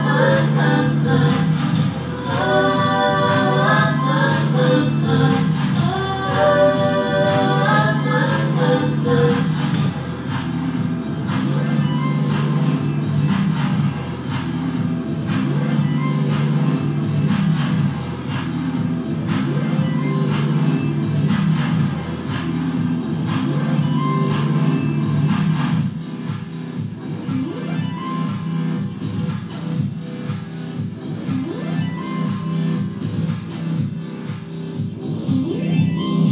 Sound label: kaossilator pro alesis air fx boss rc 30 loop station; what you can do with a kaossilator pro alesis air fx boss rc 30 loop station and a mic